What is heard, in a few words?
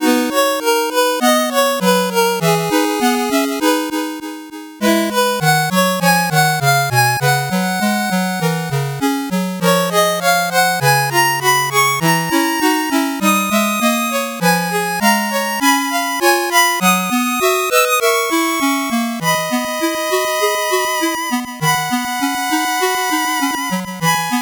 8bit bgm fast